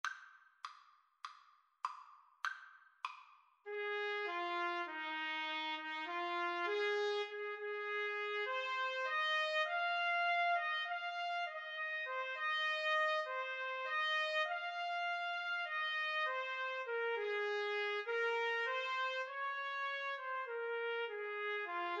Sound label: trumpet duet